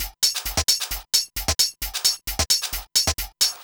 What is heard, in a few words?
No Kick Loop, Dance Beats